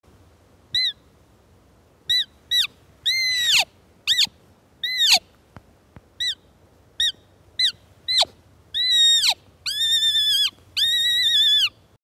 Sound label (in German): Kitz Klagelaut